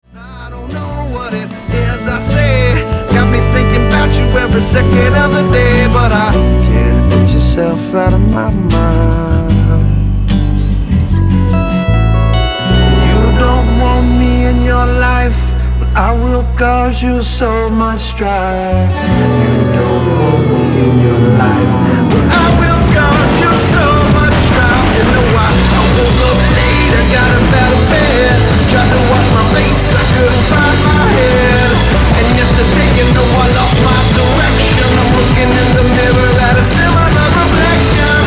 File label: Modern Pop Rock Male Vocal